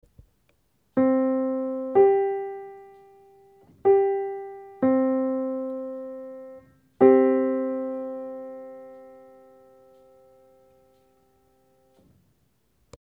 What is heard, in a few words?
701 Very Well 1.0